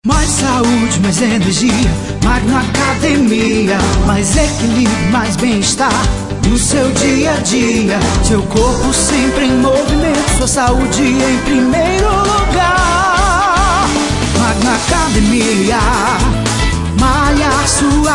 Jingle

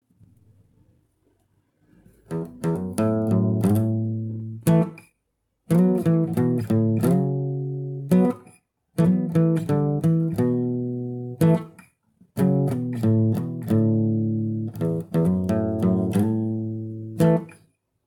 guitar

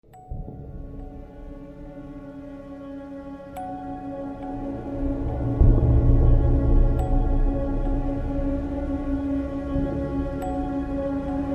dark tense horror trailer music

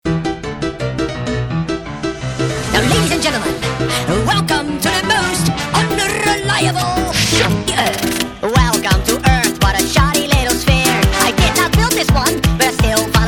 sped up

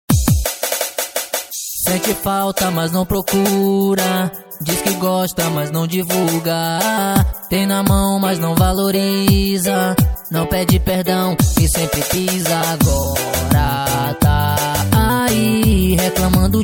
tecnomelody brega

